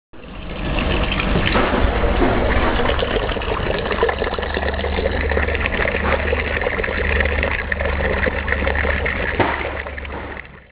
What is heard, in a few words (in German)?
Pinkeln